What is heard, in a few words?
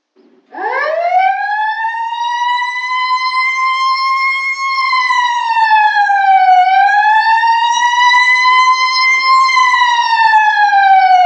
Police Siren Wail and Fade; Siren Sound Effects